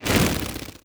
buzz soft, antlion wings; creatures

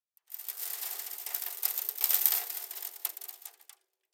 sand auto; ambient; dust2

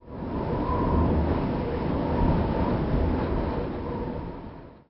wind med; ambient; Counter Strike Model Pack; CS:GO

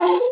move, hinges drawers; tictac

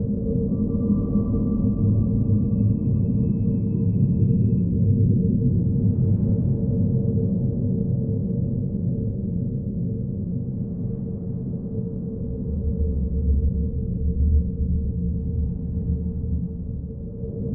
drone interior; aztec2

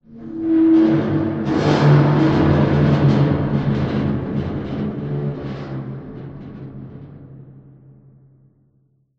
amb apocalypse wronk, ambient city

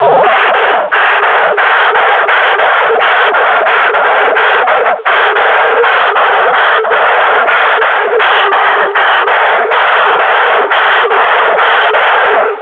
breathing, combine grunt

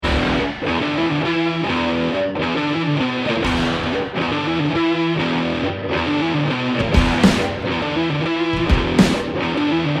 start round, music

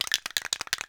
spraycan shake

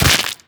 grab contact, barnacle creatures; Half Life Alyx

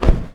bird flap; npc; Portal 2

